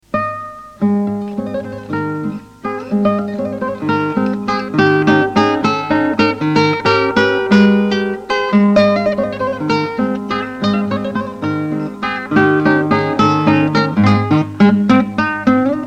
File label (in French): valse; guitare